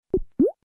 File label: click; MineSeeker